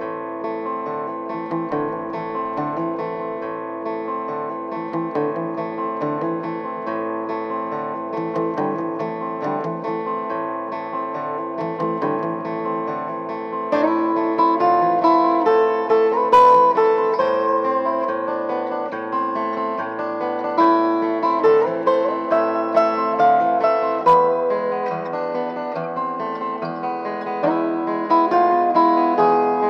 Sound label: guitar, Sad